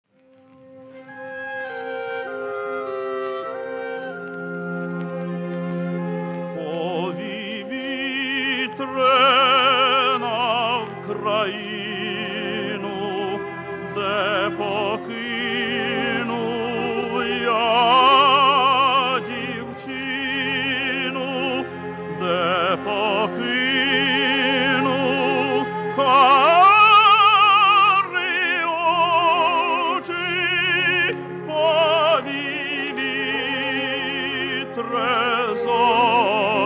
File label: ukrainian tenor